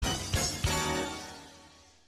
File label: suspense